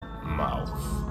chipmunk mouth

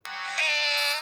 haaah; marvin

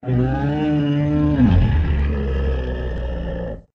growl; trtf rebooted

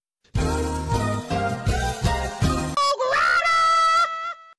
jingle; hogrider

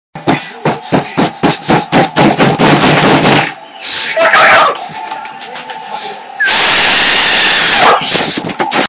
kid screaming

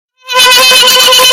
yell

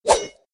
sword slash

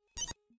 chat; quake

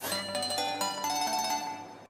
jingle; tacticooler

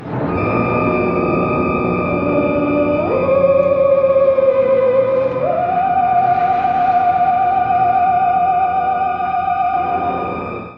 theremin

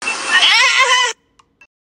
cry

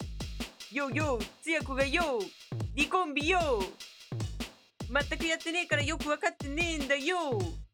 recombinator rap

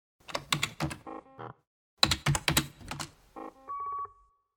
computer typing

